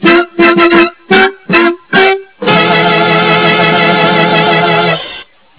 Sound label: wacky fanfare